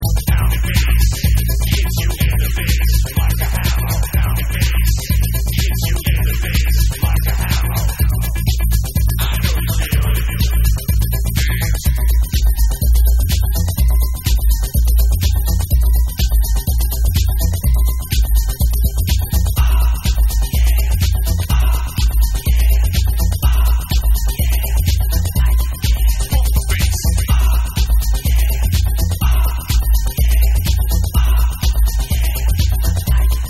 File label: TECHNO FUNK